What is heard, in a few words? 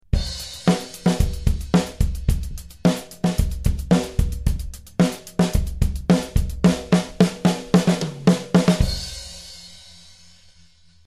16th beat